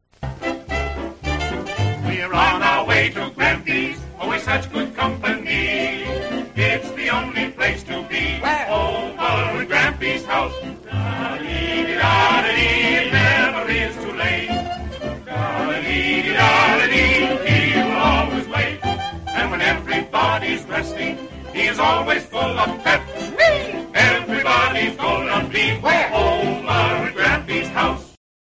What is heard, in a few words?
bg loop